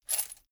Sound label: chain mail armor movement